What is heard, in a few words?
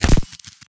thunder